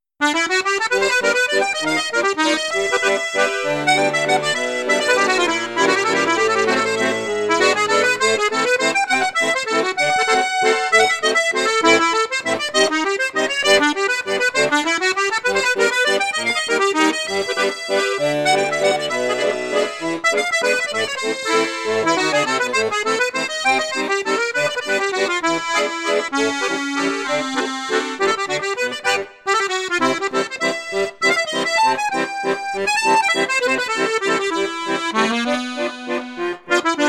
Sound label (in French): registre flute juste flute haute, accordéon; mengascini f11